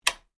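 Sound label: sounds of an electric garland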